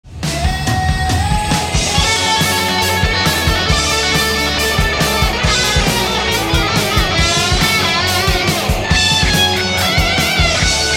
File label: crazy solo